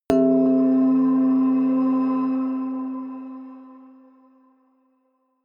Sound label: synth hit music box, hits impacts; whooshes rises